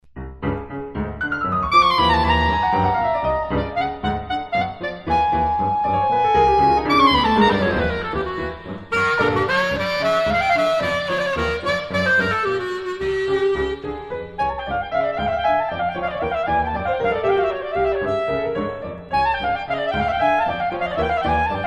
for B Flat Clarinet and Piano III. Cabaletta. Kolomyikas; Orpheus Singing